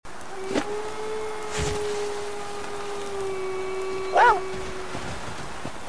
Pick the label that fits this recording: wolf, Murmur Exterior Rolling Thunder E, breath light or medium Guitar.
wolf